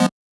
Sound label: Chord